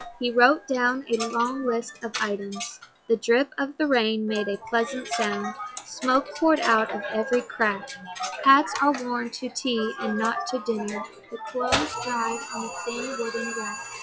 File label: casino